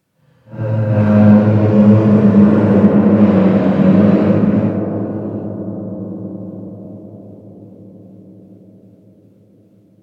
apocalyptic horn

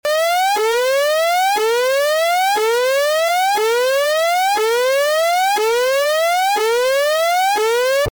alarm siren

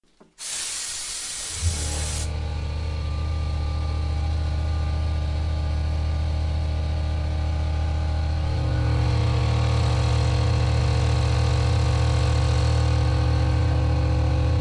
thundercane siren of doom

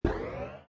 beam sound

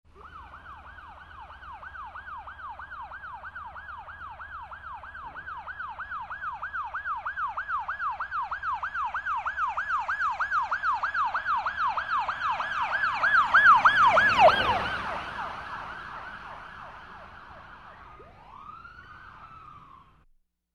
Ambulance on siren approaching

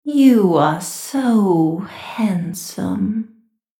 female horror voice siren

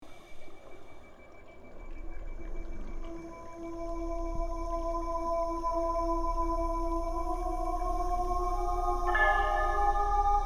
sirens song ambience

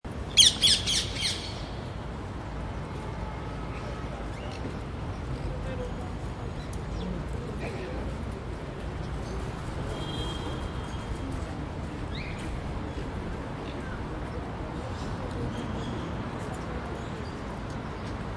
bird calls in berlin voliere

